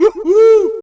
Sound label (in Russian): smeh